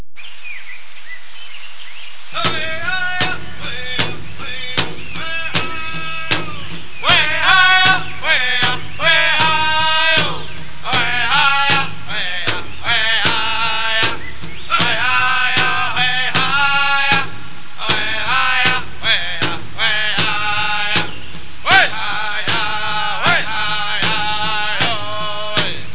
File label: step